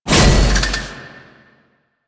Void Dragon Grapple Connect; Enemies; Warframe; Duviri

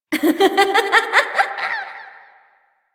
Laughter

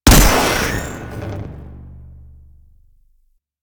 Electro Mag Charged Shot; Warframe; Corpus

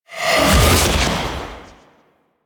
Purgatory Ghost Possess; Warframe; Abilities

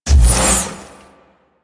Sword Whoosh; Warframe; Cephalon Weave; Weave Boss

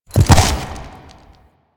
Enemy Horse Stomp; Warframe; Duviri

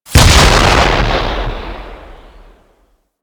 Swarm Missile Explosion; Warframe

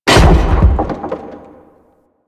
Debris Metal Wood; ambience; Warframe; Entrati

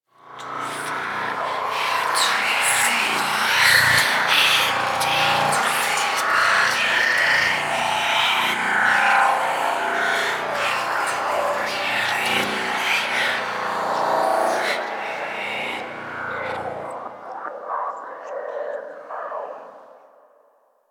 Whispers High Pitch; Ambience

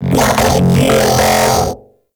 Mech Ambient Vocal; Warframe; Vehicles; NechroMech

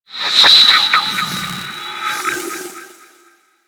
Bark; Warframe; Teralyst; Vomvalyst

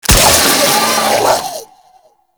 Infested Shoulder Destroy, Enemies; Warframe; Femur

